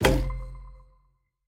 explode panther; serengetikings

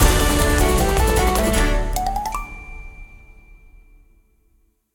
big win loop end; wolfcub

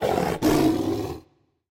panther fx high; serengetikings

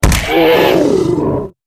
Boss Hit Light; wild worlds

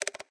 close menu; americanroulette3

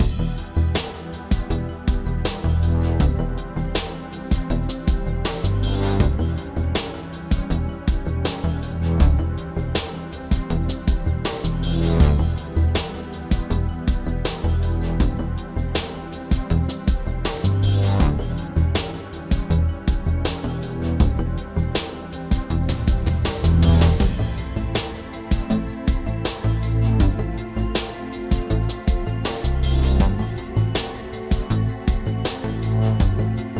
ambience Music; frenchroulette3

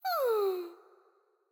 Princess disappointed; koi princess

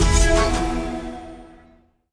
small Win; pyramid

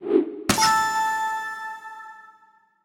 random Wilds Fall Down; finn

